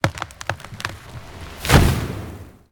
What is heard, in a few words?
tree; Cosmic Rage; activities hunt